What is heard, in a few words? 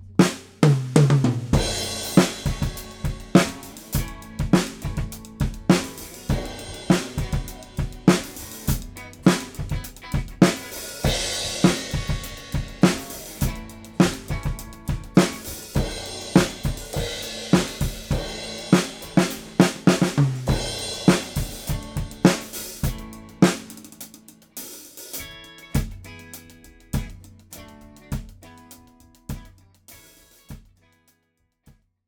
ortf only, overhead; mikros